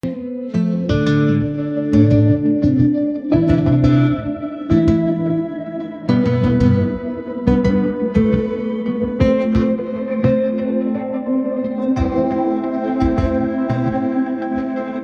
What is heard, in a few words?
sad damp autumn